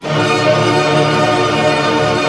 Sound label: ARP A MIN FF, STR ARP; Sound On Sound; Peter Siedleczek's Orchestra